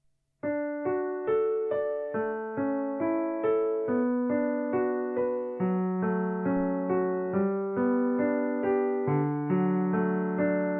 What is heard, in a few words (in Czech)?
Canon D dur piano; Canon in D (Pachelbel)